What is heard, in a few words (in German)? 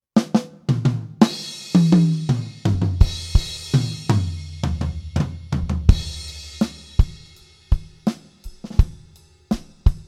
Drums unbearbeitet